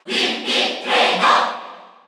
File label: Cheer Korean; Wii Fit Trainer; SSBU